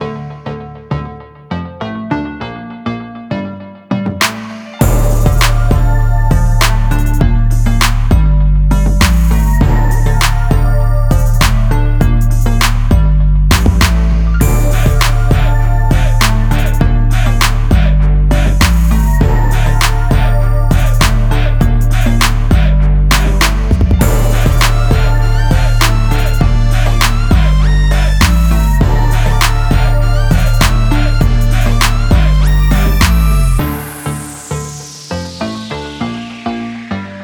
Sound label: Kicker Hip Hop